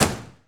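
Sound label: Ferrari Testarossa Trunk Close, Automobiles; SFX Kit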